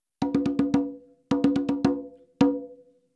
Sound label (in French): frise, congas